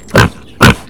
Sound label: Pig Snort; Animals